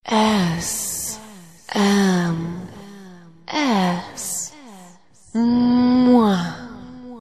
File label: SMS muahhh